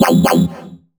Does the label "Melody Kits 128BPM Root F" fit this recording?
Yes